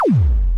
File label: laser pew pew